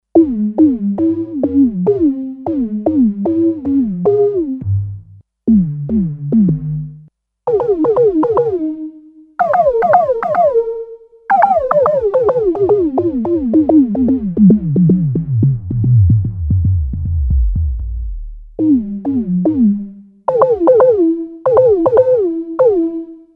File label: Electronic Percussion, Sequential Circuits Six-Trak; Factory Programs